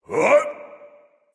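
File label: nonverbal surprise; smite; voicepack; ullr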